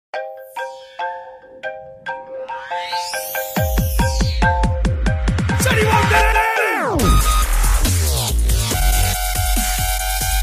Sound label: xylophone dubstep